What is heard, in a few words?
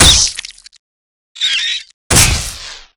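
guardian scorpion death, monster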